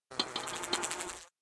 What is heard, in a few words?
recoil insect swarm; aino